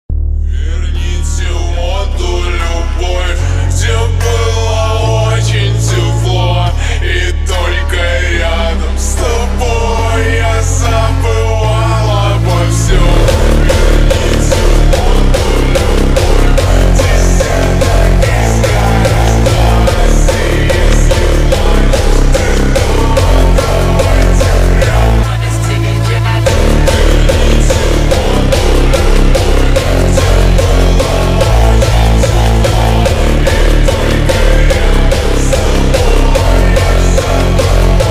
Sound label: hardstyle remix